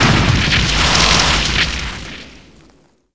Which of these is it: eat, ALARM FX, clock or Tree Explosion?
Tree Explosion